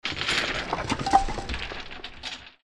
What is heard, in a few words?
Roof Collapse